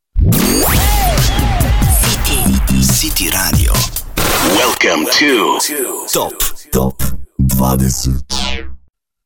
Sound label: top 20 jingle; city top 20; rtm